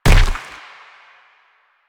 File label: Proto Infested Runner Step Default; Warframe